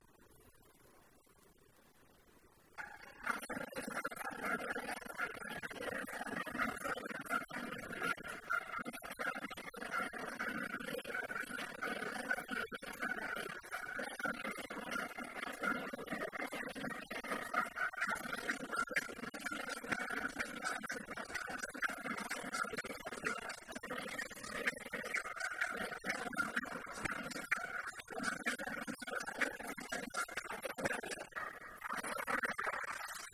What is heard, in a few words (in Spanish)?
rap